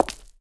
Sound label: Foot; Uru Town Chief Adrien; Seer; GamigoNA